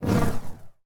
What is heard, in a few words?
Burning Hands Whoosh; Evolve; Meteor Goliath